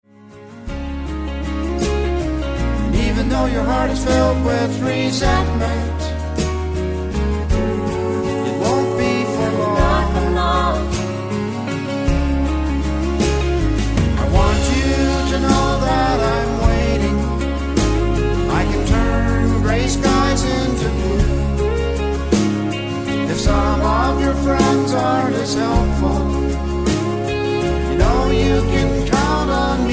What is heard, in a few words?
backing